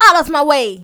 run attack

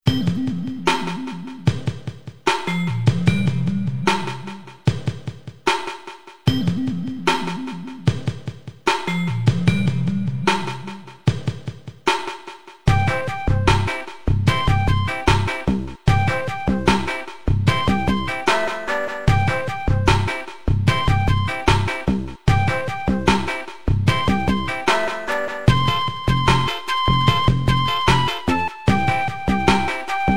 Ingame, Amiga; Bubble and Squeak; Moonchild

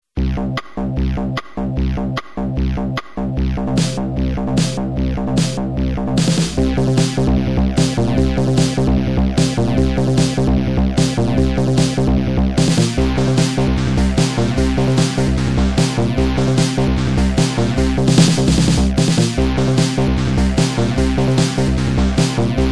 Bongo, Amiga; Moonchild

